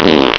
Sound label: fart